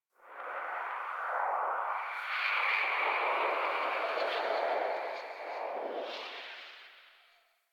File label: Venus Atmosphere Gust, Ambience; Warframe; Venus Open World; Venus Landscape